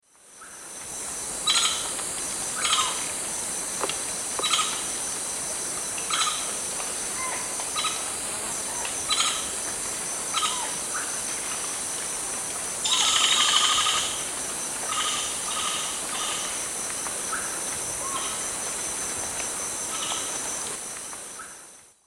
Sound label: Campephilus melanoleucos, call and flight call; S. America; Eastern Lowlands